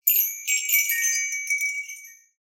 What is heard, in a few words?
Short Wind Chimes; SoundsCrate